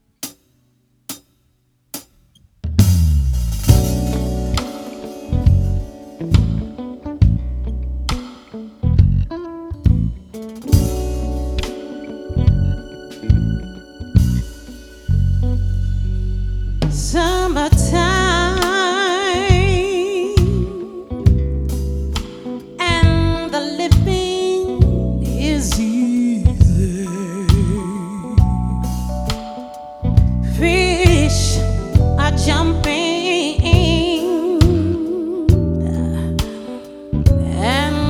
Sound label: Wedding Band; Philadelphia